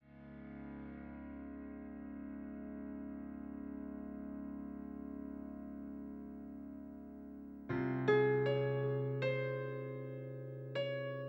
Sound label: piano 94edo